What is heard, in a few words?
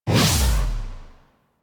Melee Heavy Attack Lift Release, Weapons Melee Whooshes; Warframe; Heavy Attacks